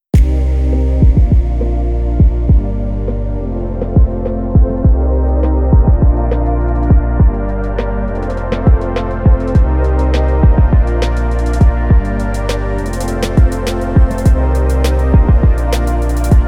chill trap

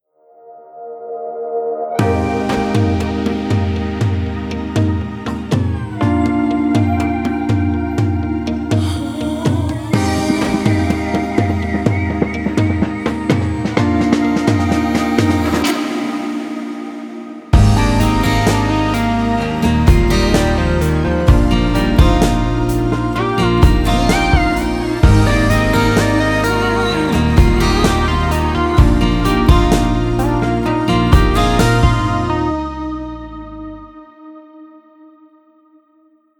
acoustic revival

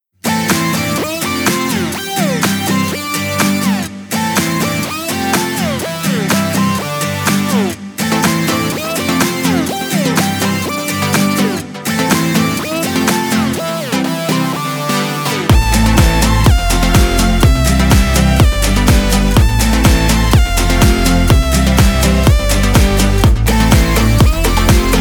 ncs

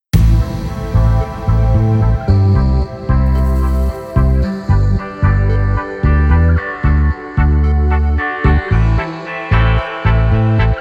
acoustic fusion

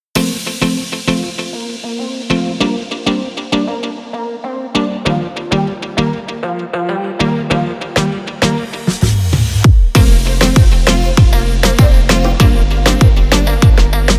tropical wave

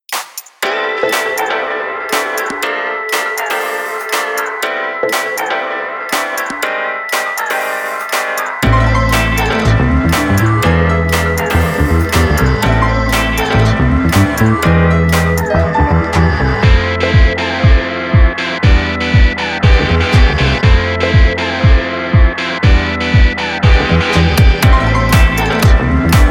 soul house

